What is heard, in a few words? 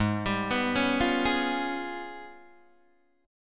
Ab Minor Major 13th, down, Guitar, DADGAD